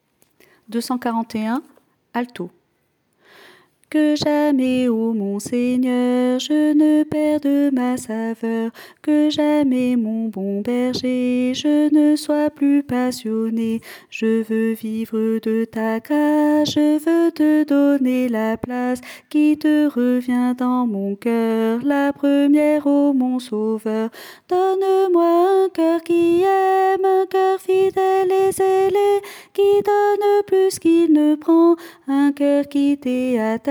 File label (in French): alto